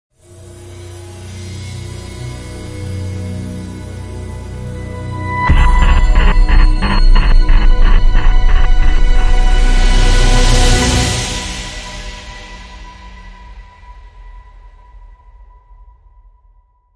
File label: Dramatic Sting